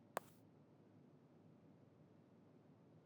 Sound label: IPHONE TOUCH